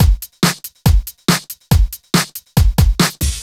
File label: Loops BB 140, BreakBeats; Vengeance Samples